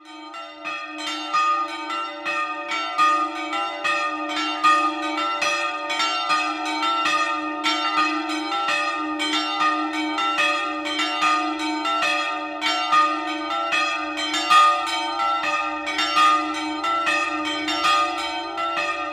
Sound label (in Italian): Torrate, campane